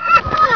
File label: chicken attack; svencoop; hunger